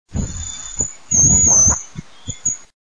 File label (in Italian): francolino